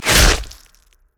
basic attack claw; rengar

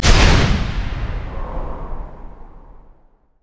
grand skyfall jump; pantheon

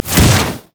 wildfire; LoL; brand zombie

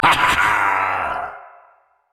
pirate laugh; raise moral; LoL; gangplank

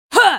effort; Character Voices; English

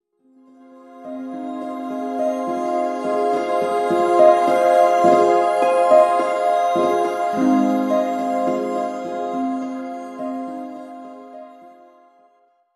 hymn of valor melody; sona

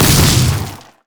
execute; LoL; darius norseking

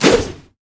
wolfman crit attack; LoL; warwick

